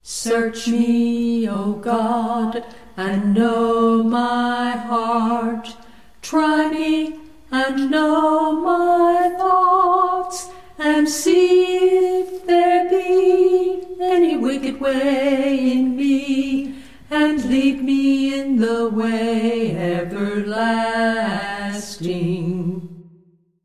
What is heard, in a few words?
a capella scripture song; psalm